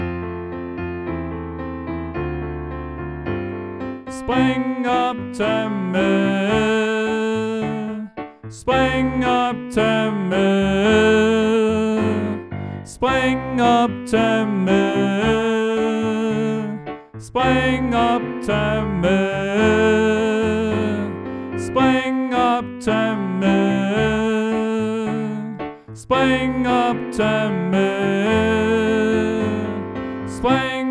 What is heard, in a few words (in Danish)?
Tenor; oevefiler